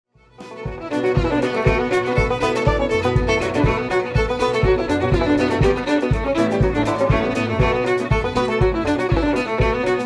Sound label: banjo